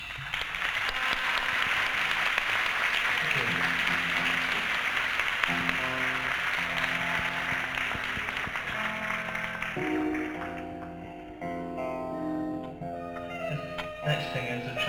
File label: Tuning